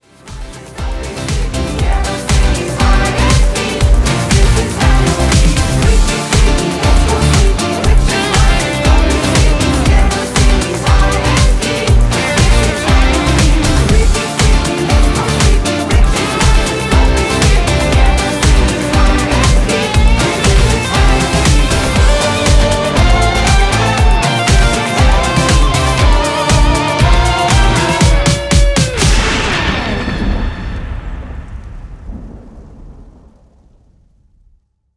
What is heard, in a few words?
Instrumental